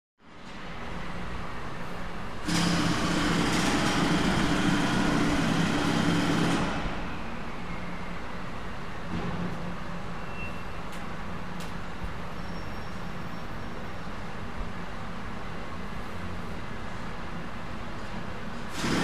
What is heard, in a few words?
Perspective With Bouncy, Slightly Confined Sound Like Around A Corner Or Interior Open Window Point Of View. With Medium Distant Honks And Truck Rev.